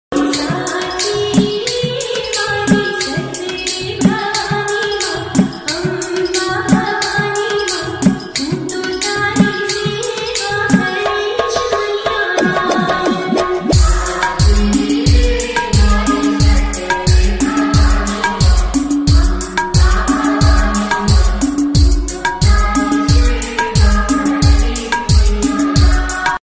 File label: navratri ringtone background music